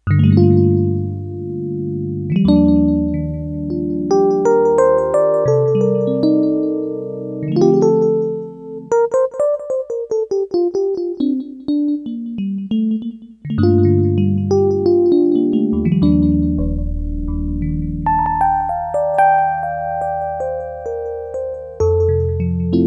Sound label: El. Piano, Korg DS-8; Factory Patches